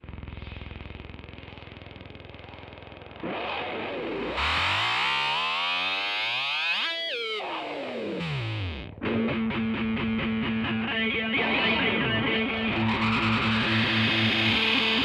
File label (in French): bruit